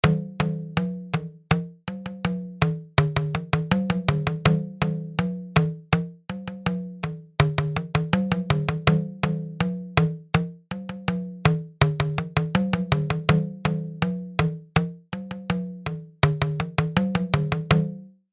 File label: Indian Percussion Tabla Chowtal; Syntheway